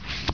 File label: deal, casino